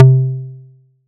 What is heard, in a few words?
SY TAKBAS; ModelCycles